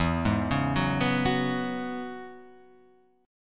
E 6th Add 9th E, Guitar Open F; E6add9 arpeggiated; JGuitar

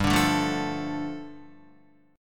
G 7th Suspended 2nd, Guitar; strummed; JGuitar

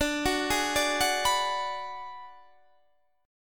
D 6th Flat 5th D down Guitar Standard, chord; D6b5 arpeggiated; JGuitar

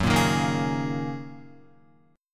Gb 6th Add 9th Gb down Guitar; strummed; Gb6add9; JGuitar

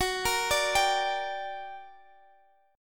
G Minor Major 7th F sharp

